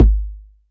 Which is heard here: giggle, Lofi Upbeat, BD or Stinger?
BD